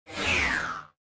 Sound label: drone swoosh; ships salvaging; CosmicRage